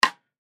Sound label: Drum Snare; Snare 14 Inch Pacific Cross Stick; Drum Kit Sound Effects; Blastwave